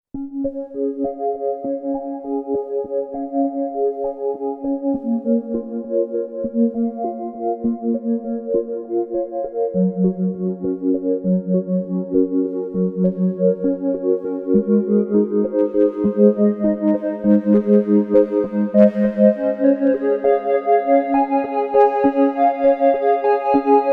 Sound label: Sequential Pro 3 Parasoft